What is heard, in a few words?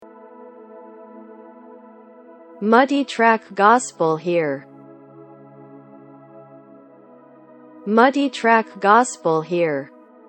Keys Pad